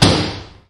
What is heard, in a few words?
hit